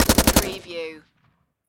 Machine Gun SIG SG 552 Commando Long Burst Close Perspective